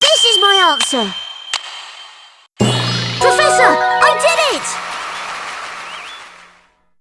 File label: lead up win